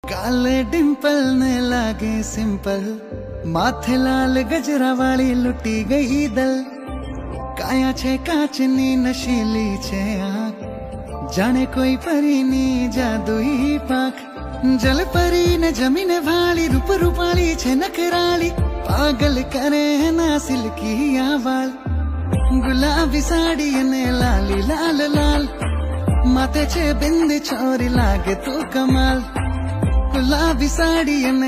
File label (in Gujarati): gujarati version gujarati ringtone